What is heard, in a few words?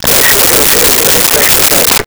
Police Radio Chatter; Communications